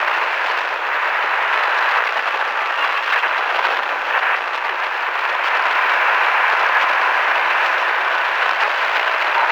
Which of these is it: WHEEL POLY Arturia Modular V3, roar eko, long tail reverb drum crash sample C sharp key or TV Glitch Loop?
TV Glitch Loop